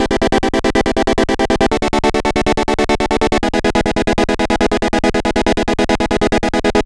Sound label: 140 Chord E, 140 BPM; loops; CHIPSHOP DELUXE